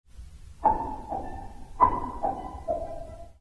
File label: Piano Stoch